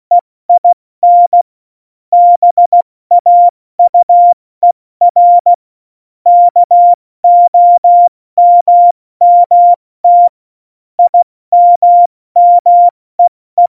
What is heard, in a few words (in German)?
Witz, Morsekurs, 70 F80